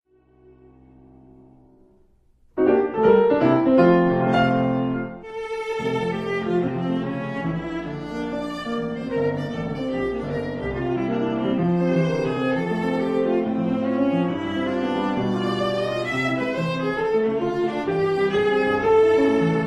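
Phantasiestücke for Viola and Piano Allegro molto agitato; Reinecke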